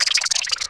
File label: spore neutral